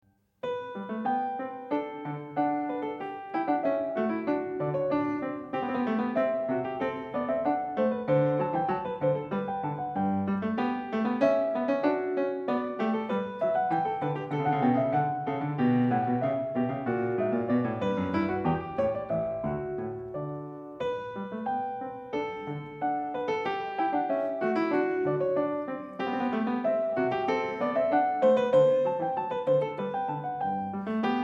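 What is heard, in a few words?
Goldberg Variations, Canone alla Sesta, piano; Bach; 1982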